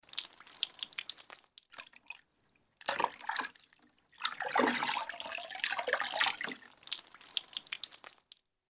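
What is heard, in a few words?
splashes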